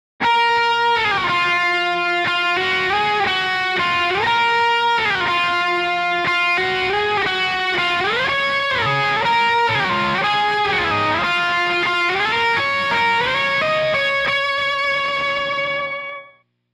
b gtr

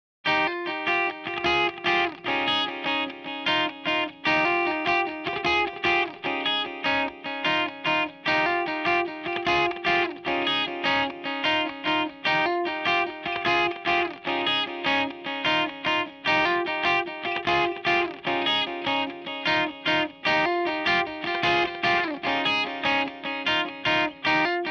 c gtr

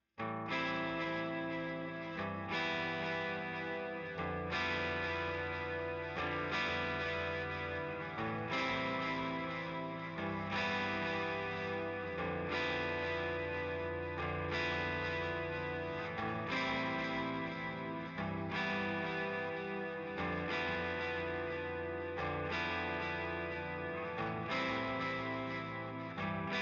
gtrs; stems

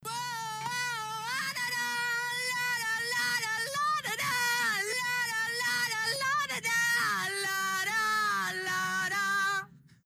High backing vocal

